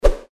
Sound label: Fighting Swing Sound; FXHome